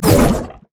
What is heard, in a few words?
mariner bomb; LoL; fizz skin04